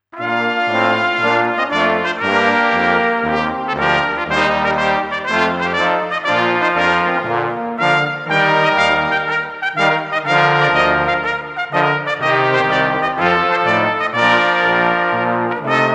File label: Trumpet Voluntary, Brass Quintet; Highcliffe Brass Quintet